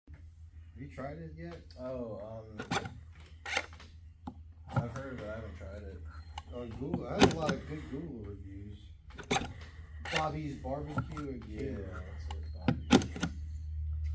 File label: jaguar fuel door actuator; XF XFR X250